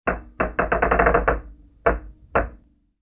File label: Wood Planer Machine Squeak